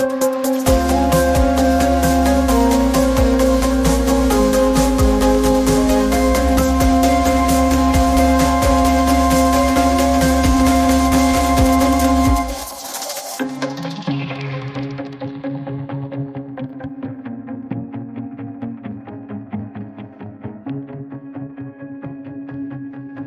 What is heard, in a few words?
background music